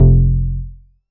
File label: bass classic warm F; Polyend; ARC Noise